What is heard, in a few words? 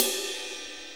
DRUM TOOLS